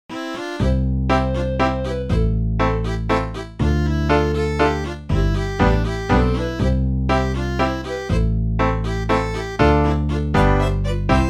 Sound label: mazurka